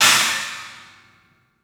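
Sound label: CLUB CYMBALS; 3D Sound; 3D Drums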